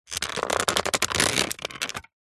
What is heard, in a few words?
plastic creak stress; foley